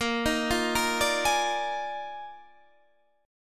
D sharp Major 7th Suspended 2nd Suspended 4th, A sharp, guitar chord